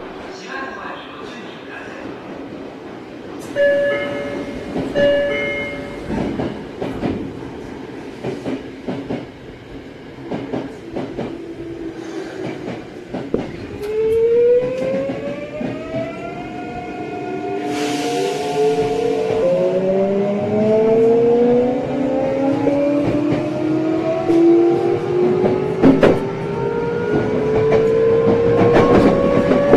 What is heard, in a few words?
vvvf, mitsubishi; eidan